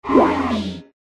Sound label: Earth Spirit Wound